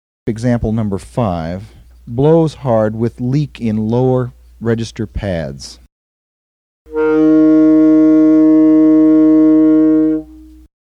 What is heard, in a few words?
Emb SAX; saxophone